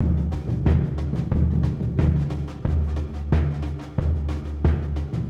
Surdo; Ambient Grooves; World Groove

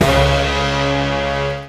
HIT, Hits & Brass

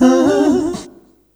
VOX; USB Soundscan vol.32; Roots Disco; AKAI

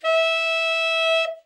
tenor soft, sax; Giga Samples Collection

